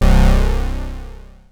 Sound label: juno release low